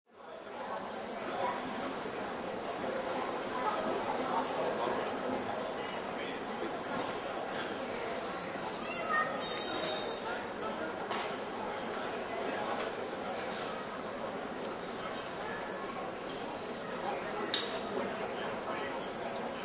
Airport Departure Hall Light, Airport departure hall ambience. Light traffic, light crowd.